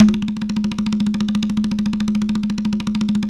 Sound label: CNG ROLL, ST CONGA; AKAI S-Series CD-ROM Sound Library VOL-5